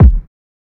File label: KICKS